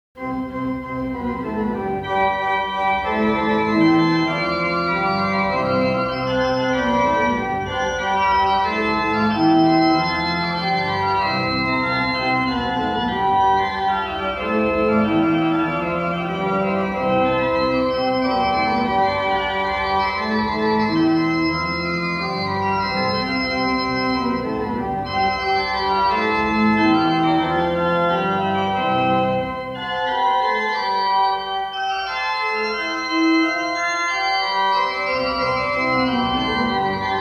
Chaconne in C Major; Buxtehude; Prelude Fugue and Chaconne; III Chaconne

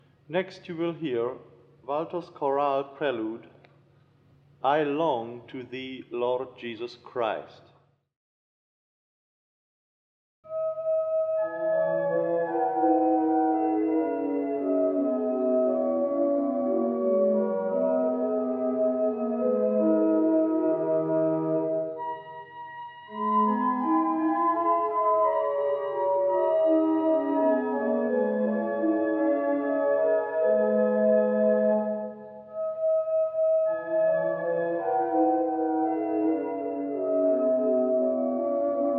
Chorale Prelude; Walther; I Long for Thee Jesus Christ

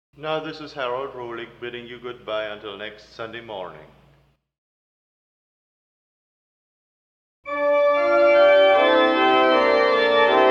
Improvisation

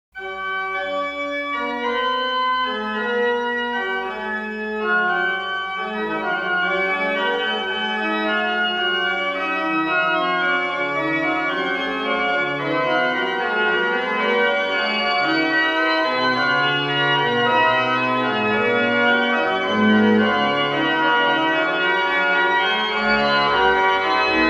Fugue in G Major; Bach; Little Prelude and Fugue in G Major BWV 557

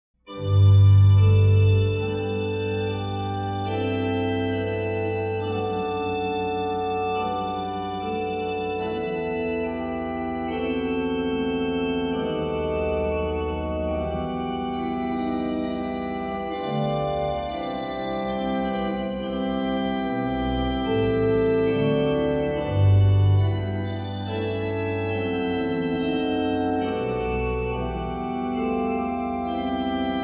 Chorale Prelude; Bach; Dearest Jesus at Thy Word BWV 730

